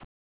left foot

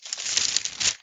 paper rustling

